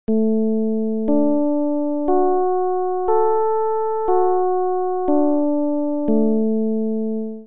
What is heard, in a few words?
csound foscil 220hz